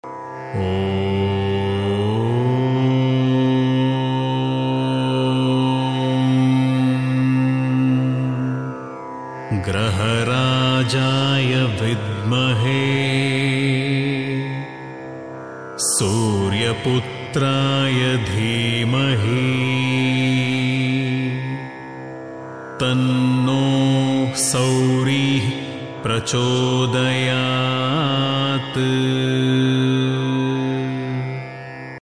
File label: shani gayatri male, gayatris